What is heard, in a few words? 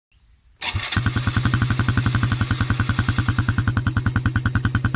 supercub110 muffler